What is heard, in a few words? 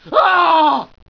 pain; svencoop; decay